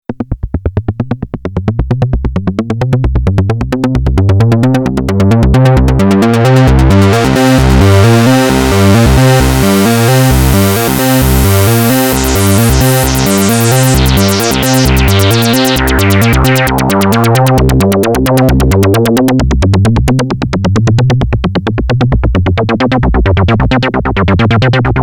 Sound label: VCF